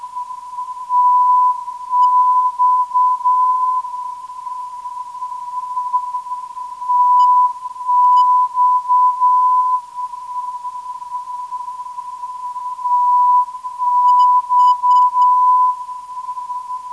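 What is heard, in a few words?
TX 442.48kHz, non-directional beacon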